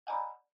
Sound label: OS Sound (Speaker-Notification)